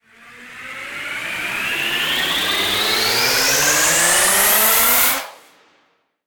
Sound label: longer Anticipation; starburstxxxtreme